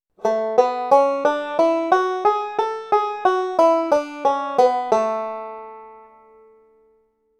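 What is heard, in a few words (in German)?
a dur tonleiter, banjo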